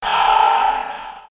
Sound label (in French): cri esprit